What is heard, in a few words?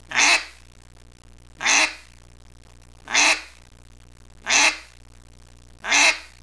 hen